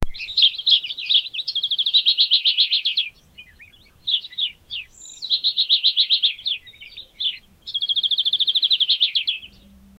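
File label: birds chirping in the morning; 30 seconds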